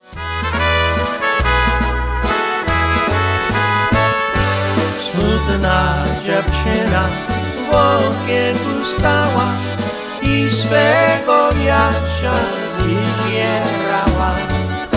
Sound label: Waltz